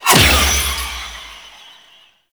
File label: Shackle Impact; Warframe; The New War; Deacons